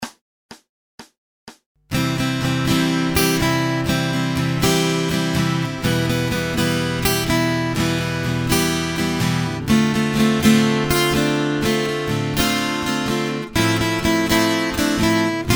Acoustic Accompaniment, acoustic guitar

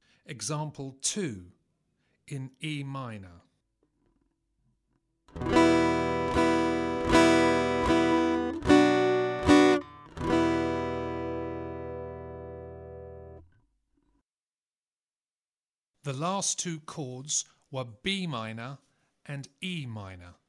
Aural Harmony Test, acoustic guitar; Grade 5

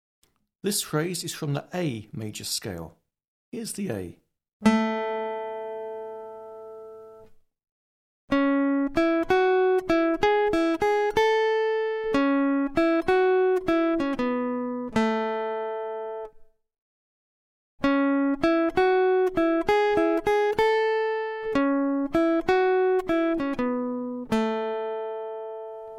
Aural Pitch Test, acoustic guitar; Grade 8

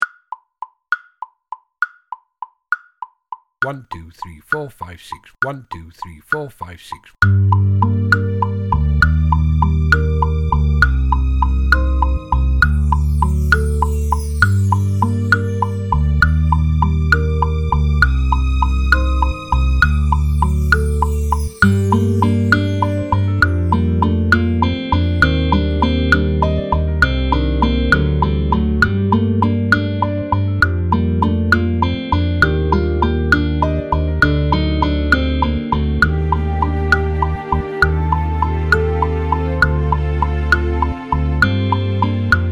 Drifting Click Quavers, drum kit

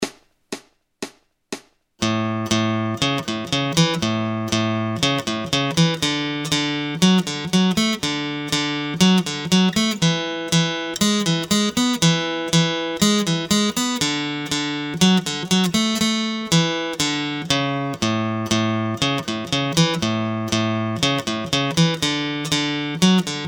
Acoustic Accompaniment, acoustic guitar

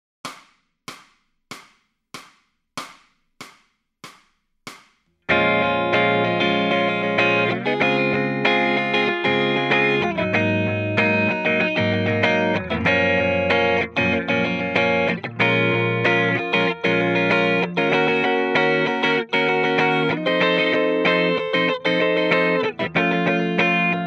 Lead Backing Track, electric guitar

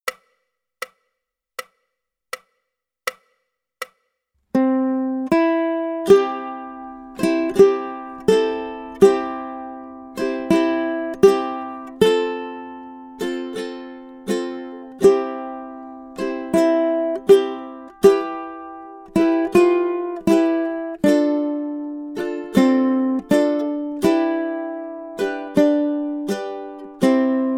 Michael Row, ukulele

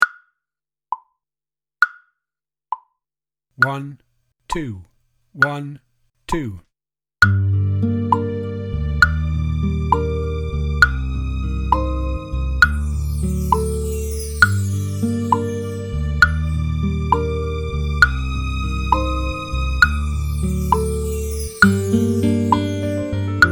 Drifting Click in 2, drum kit

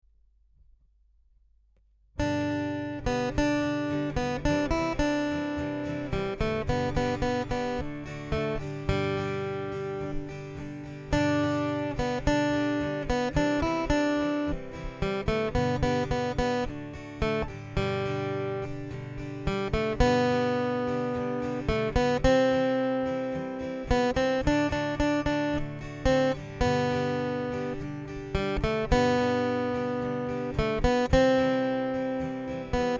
acoustic guitar; Banaha

